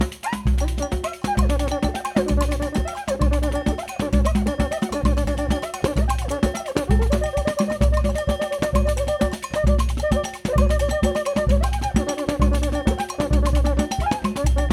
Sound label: Rio Batucada, 130 bpm